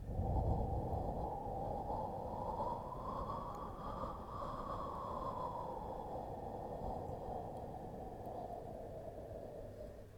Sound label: Wind